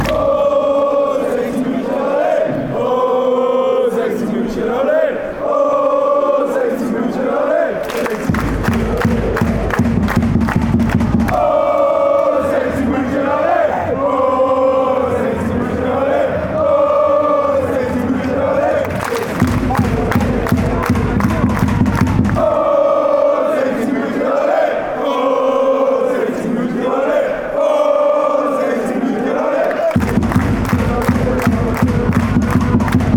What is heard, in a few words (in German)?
Ooh München olé, fanchants